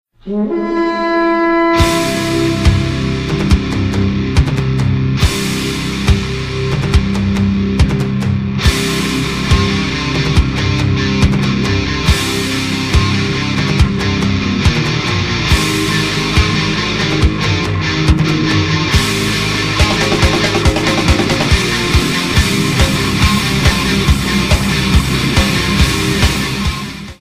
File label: Theme Song ringtone; Minnesota Vikings